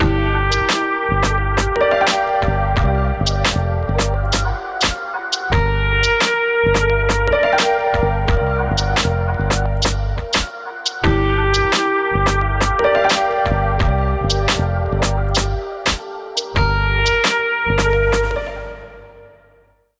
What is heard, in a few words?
Punjabi Folk Celebration; yuttari